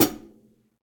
Hihat Foot Session Dry; Drum Hits; Standard Hits; Ableton; Factory Packs; Session Drums Studio

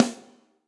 Snare Hit Bright Room; Drum Hits; Standard Hits; Ableton; Factory Packs; Session Drums Studio

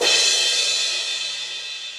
Crash 18 Reso Classic, Cymbal; Drums; Drum Hits; Standard Hits; Ableton; Factory Packs; Session Drums Club

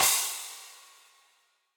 Crash FX Big Punchy, Cymbal; Drums; Drum Hits; Standard Hits; Ableton; Factory Packs; Session Drums Club

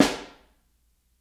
Snare Overhead

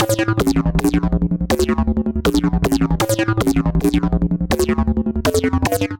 Punch Growl D Minor 160 bpm, Bassline; MIDI Clips; Tonal; Skitter and Step; Ableton Factory Packs

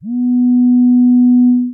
Broken Synth, Vector FM; Press Keys

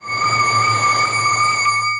Resonant Melody; Tree Tone Presets; Press Keys; Ableton; Factory Packs; Inspired by Nature; Dillon Bastan

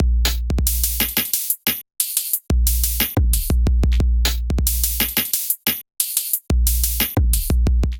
Glitch Kit; Drums; Tokyo Glitch Kit; Ableton; Factory Packs; Glitch and Wash

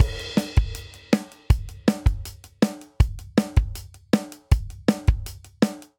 Energetic Pop Verse 160 bpm; 4 4; MIDI Clips; Ableton; Factory Packs; Drum Booth

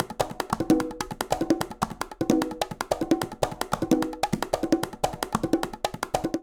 Latin Jazz Bongo Conga 149bpm; Latin Percussion; Ableton; Factory Packs

